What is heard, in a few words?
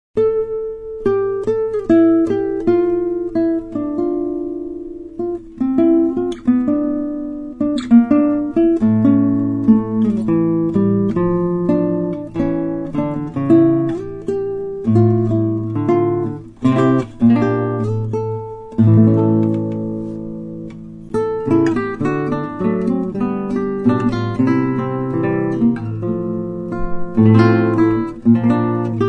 visee prelude, guitar; Suite